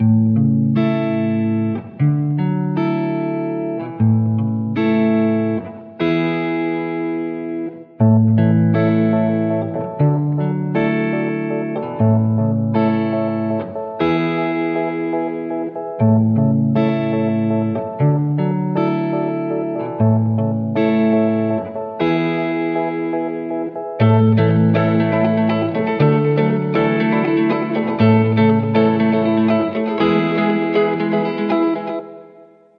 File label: Sunny Forecast, No Drums